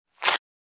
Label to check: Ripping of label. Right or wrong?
Right